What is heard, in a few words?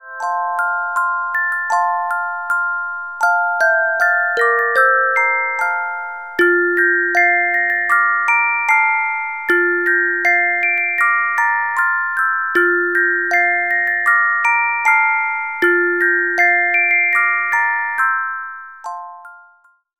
Carillon Music Box Melodies